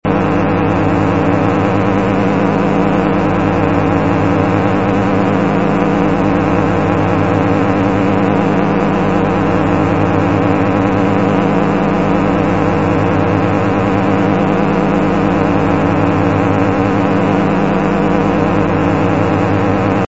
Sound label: sound noise generator